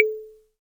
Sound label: Ping, Univox SR-95